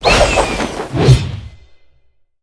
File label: lunge leap no vox; smite; odin